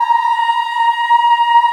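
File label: A#2 child ah left, Choir; USB Soundscan vol.28; Acoustic & Synth; AKAI; CHILD AHF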